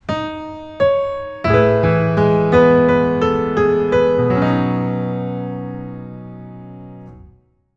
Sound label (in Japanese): ジングル; A Synopsis of Girls Who Don't Flatter Me. Original Soundtrack; Kobinai Kanojo no Shohousen